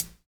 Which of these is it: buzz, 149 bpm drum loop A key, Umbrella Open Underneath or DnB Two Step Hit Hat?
DnB Two Step Hit Hat